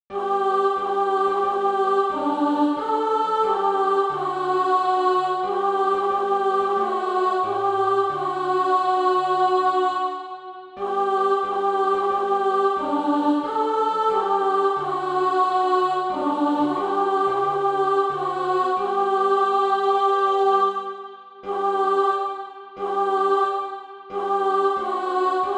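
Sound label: alto, SATB Practise Music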